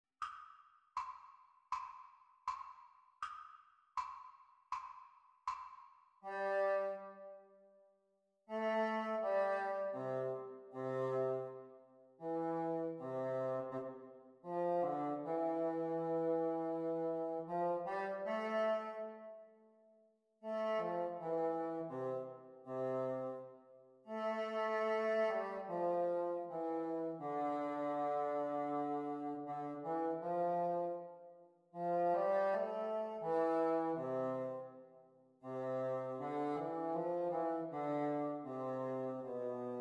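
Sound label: bassoon duets practice speed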